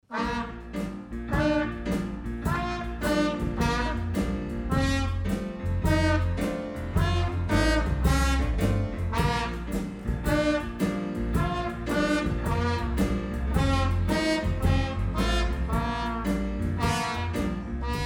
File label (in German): Aufblasblues, Jungbläser